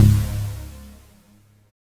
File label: NOISY Ab1, dubstep; Jack Sparrow; Deep Dubstep Progressions